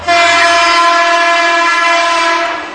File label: Klaxon, Train; OpenBVE